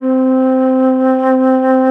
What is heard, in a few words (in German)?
FLOTE SOFT C; FLOETE; Best Service; Alpine Volksmusik